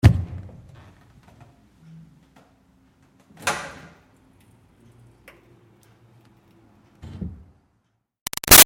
Door Wood Push Bathroom O-C; Open - Close; Miscellaneous Doors; Big Room Sound

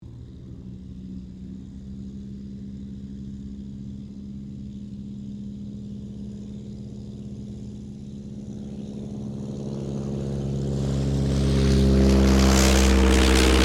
AIRCRAFT DH82A DEHAVILAND TIGERMOTH TAKEOFF; 1940S; Mixed selection of Tigermoths from the 1940s; Airplanes

